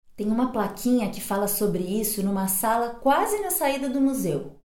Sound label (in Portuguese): erro